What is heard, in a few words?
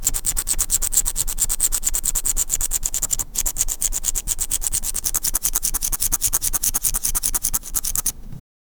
ink quill scratch, writing